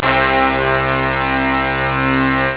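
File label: trumpet octave; jazz jackrabbit 1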